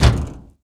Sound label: metal auto, player footsteps